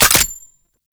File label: Gunbrella Low Clip Mech; Warframe; Tenno; Koumei